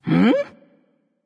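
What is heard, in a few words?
confused, female; smite; voicepack; hera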